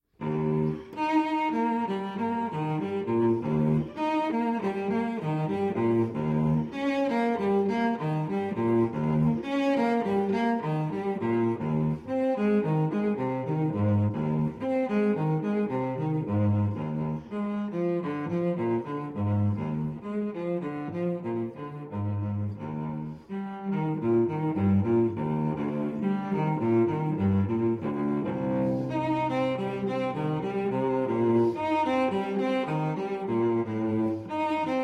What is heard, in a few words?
Cello Suite in E-Flat Major Prélude; Cello Suite No. 4 in E-Flat Major, BWV 1010